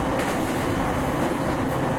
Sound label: E231 top bridge; tetsuon